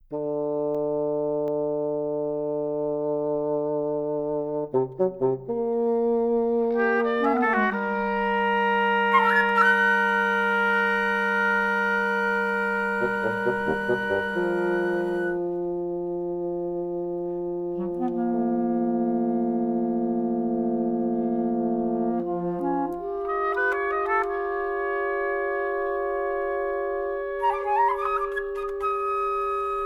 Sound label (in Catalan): Per A Quintet De Vent, Moderato